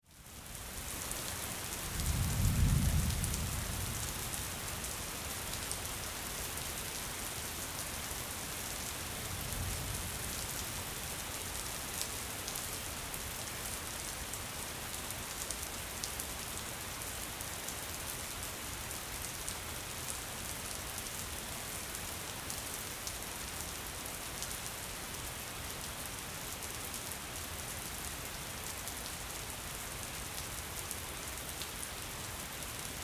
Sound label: Weather Rain Pour Light Splatter Thunder Ambience